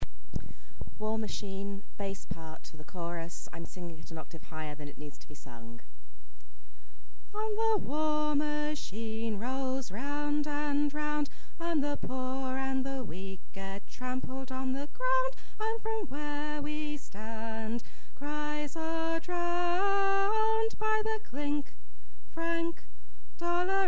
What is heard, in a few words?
bass